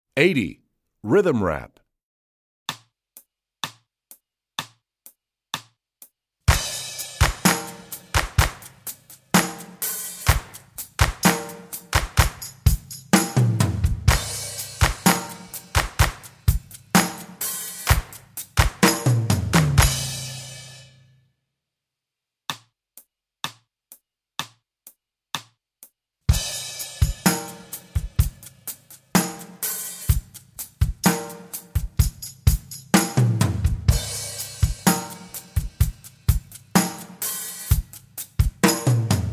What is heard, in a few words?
Rhythm Rap, Strings; EE2000 Book2